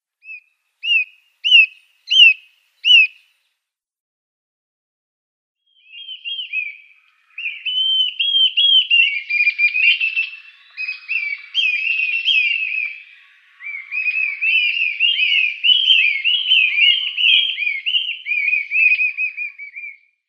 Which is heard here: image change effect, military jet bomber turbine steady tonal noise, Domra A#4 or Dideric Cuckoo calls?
Dideric Cuckoo calls